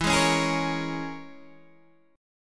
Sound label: E 6th Flat 5th E down Guitar Open D